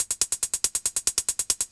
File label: hihat